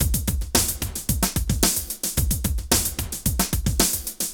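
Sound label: drum loops, right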